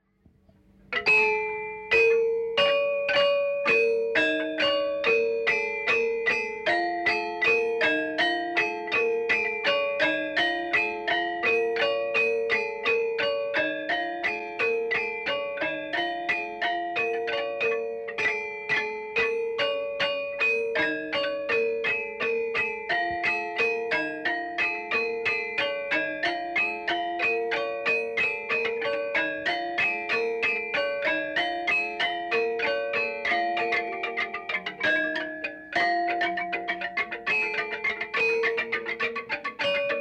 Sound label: Asak gambang Sudamala